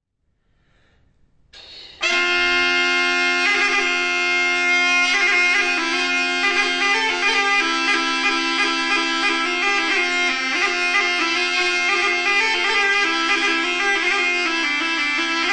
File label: intrada platerspil dudy; susato